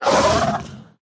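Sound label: riding lizard stun; ezdovoy yasher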